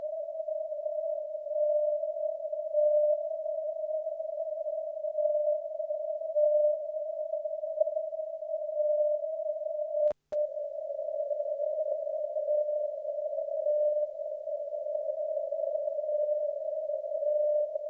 CW Alpha Signale; Reuter Pocket

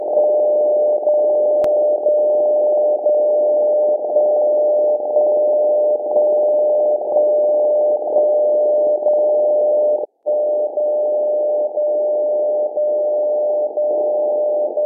Zeitzeichen 77.5khz

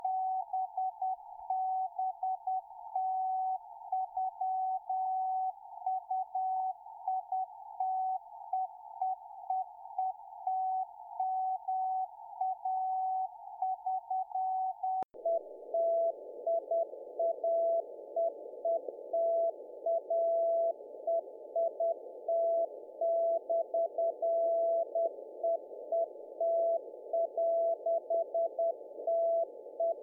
CW Bake; 28257khz; Malahit DSP; DK0TEN; Afedri

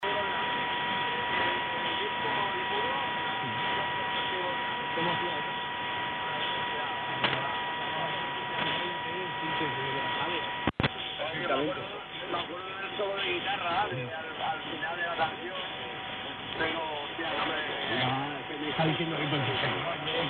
Mittelwelle Spanien 1575khz

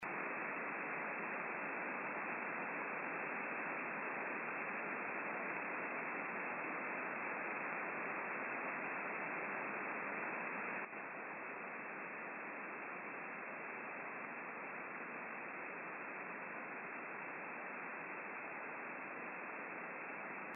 Rauschen auf freier Frequenz

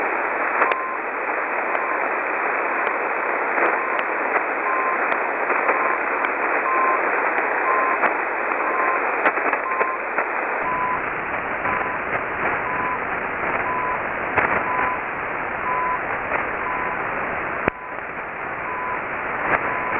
Zeitzeichen CHU Canada USB; 14670khz

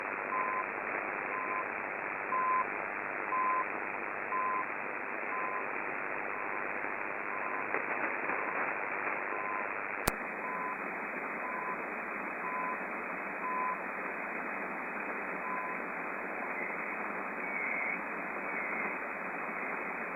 Zeitzeichen CHU Canada USB; 14670khz

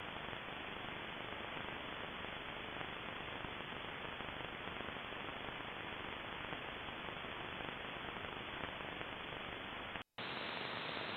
Bandrauschen bei 15175khz AM; 7khz Bandbreite

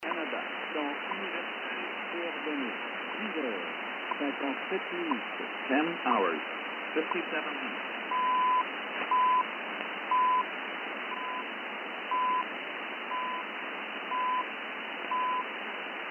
Kurzwelle 20m 14670khz; CHU Ottawa Kanada

